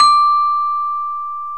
VIBES BELLS

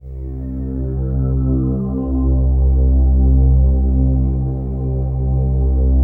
COSMIC, PADS; Masterbits; Vintage Voltage

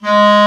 Clar mf G#2; Digital Sound Factory Virtuoso

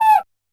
Cuica Mute, Standard Kit, Yamaha PSR-E323

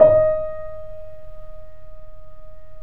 The Piano, D#; InVision Interactive - The Piano; BOESINGSOFT

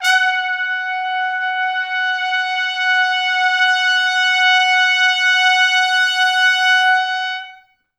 Pop Brass; Best Service; ProSamples vol.19; AKAI

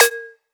Serum, snare

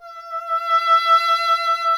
E. Horn

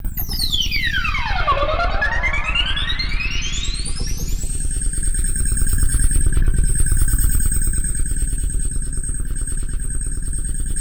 4KHZ OVER2, Anime Sound FX; FLYOBJECTS

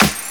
SD, Dance Drums

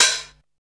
HH, Dance Mix

